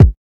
reverb kick one shot b key; kick drum samples

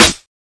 bright snare e key

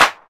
clap single shot g key; single hits one shots

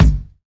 kickdrum g sharp key

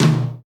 hi tom b key

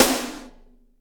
big steel snare drum sound c key

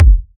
smooth kick drum sound F sharp key; kick drum samples

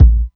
sharp kick drum single hit a key; kick drum samples

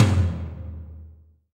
reverb tom sample d sharp key; tom drum; tom drum samples

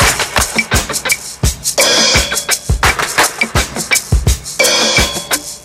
85 bpm 00s drum loop b key

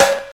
dry rap acoustic snare sound C sharp key; snare drum samples

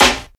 clear snare drum sample c sharp key

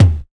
clean tome drum sample a sharp key

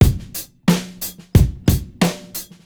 90 bpm 00s drum loop f sharp key

